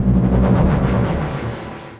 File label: Synth Sting; musicradar